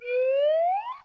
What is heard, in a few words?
Special Photo Birthday Card Balloon Rise